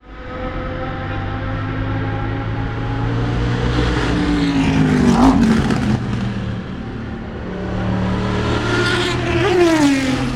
corvette and aston martin before tertre rouge sunday